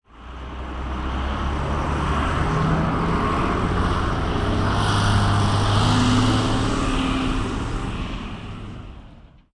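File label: car one shot, harbor; Source Engine; tf; cp harbor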